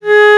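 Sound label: Bass Clar p G#3; Digital Sound Factory Virtuoso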